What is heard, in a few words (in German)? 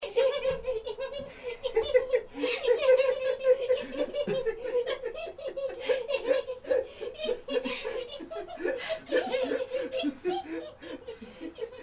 psycho hihi